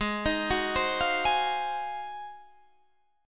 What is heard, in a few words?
Ab 13th Ab Guitar Standard; arpeggiated; JGuitar